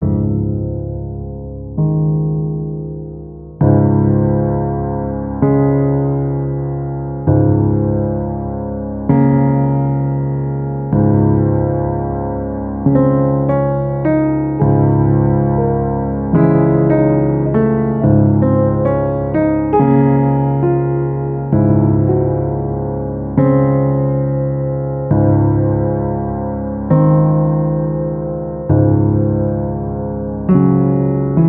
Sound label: Dark Slow Sad Piano only; CUES for Film TV Games etc SOLO PIANO - or mostly piano